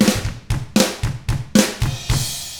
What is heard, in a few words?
ROCK